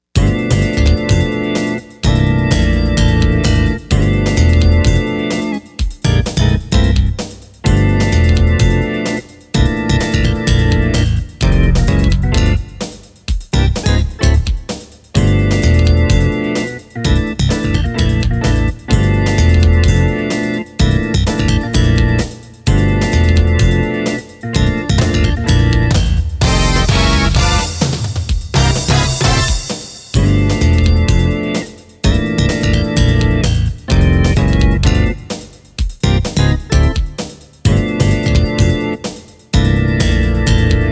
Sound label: Remix Roland SC-8850